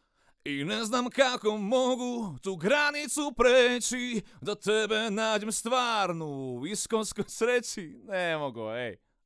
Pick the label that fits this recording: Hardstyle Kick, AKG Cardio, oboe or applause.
AKG Cardio